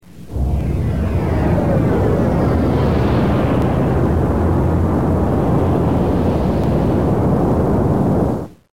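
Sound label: drop impact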